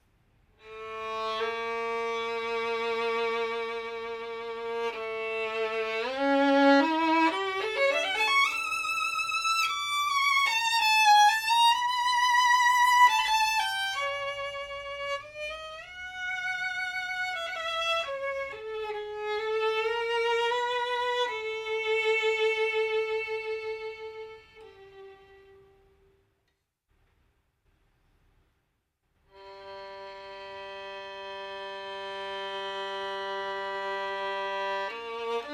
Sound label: Master, Professional Violin